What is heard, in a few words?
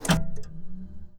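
grate button off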